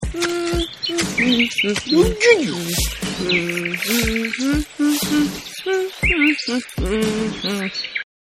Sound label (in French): jardin pelle rateau foret calme chante heureux timide cache son musique ambiance; munch room